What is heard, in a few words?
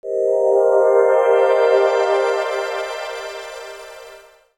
Digi Pads; Jungle Frenzy 1